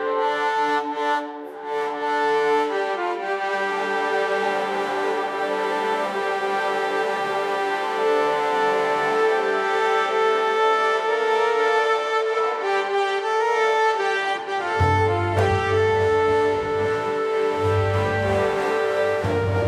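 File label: epic indian orchestral music, veena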